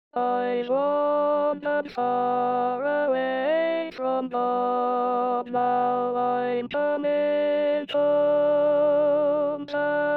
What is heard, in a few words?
Altos